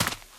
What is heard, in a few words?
dirt, footsteps survivor walk; Left 4 Dead 2